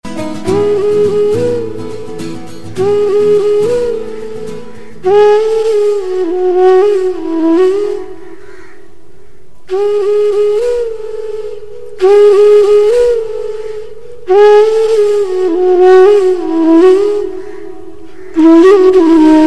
Refreshing Flute Tone

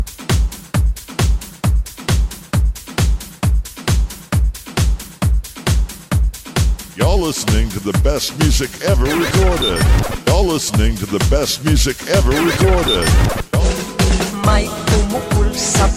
House Music

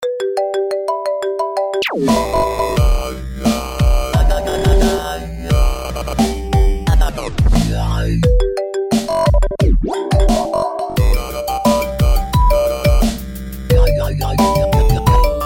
DJ Mix

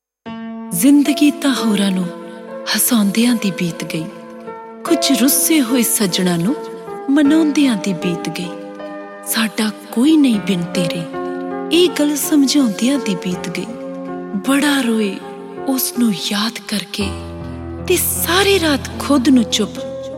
Punjabi

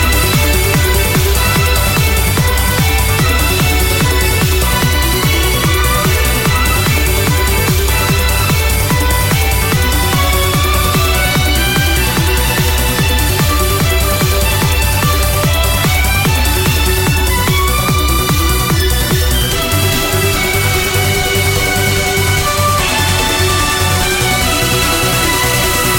Epic Techno

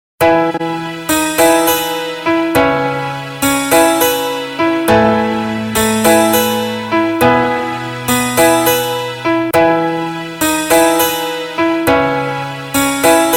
Instrumental Tune

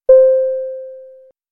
Elevator Ding Nicer

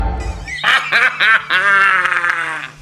Menacing Laugh

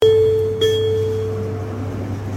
Otis Down Chime

